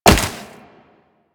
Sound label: Wall Jump Snow; Footsteps; Warframe; Tenno; Wall Run